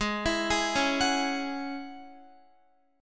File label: Ab 7th Suspended 4th Sharp 5th Ab down Guitar; Ab7sus4#5 arpeggiated